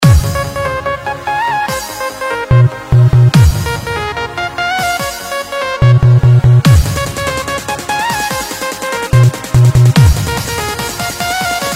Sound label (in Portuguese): funk agressivo ritmado e funkadao